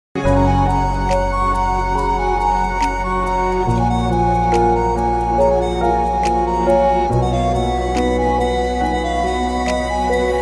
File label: Flute Ringtone